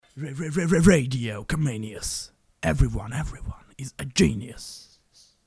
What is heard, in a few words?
jingle